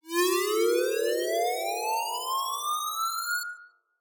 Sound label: Trap Modded Riser